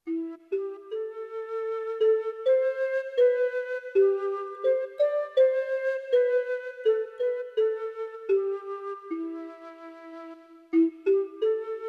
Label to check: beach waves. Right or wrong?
Wrong